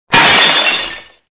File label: glass shatter